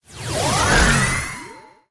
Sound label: In; Zenonzard